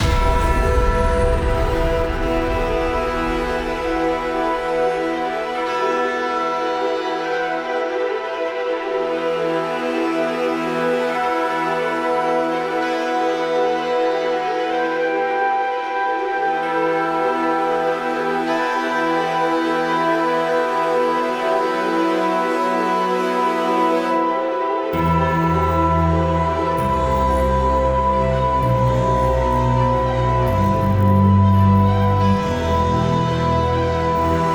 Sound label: Finale Explosion